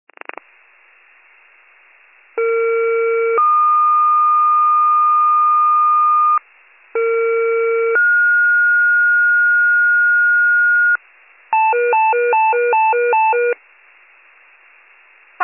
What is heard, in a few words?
FIRE pager alert